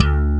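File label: digital bass; AKAI S-Series CD-ROM Sound Library VOL-2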